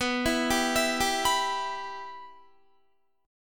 B Suspended 4th Sharp 5th B down Guitar, Bsus4#5 chord; arpeggiated; JGuitar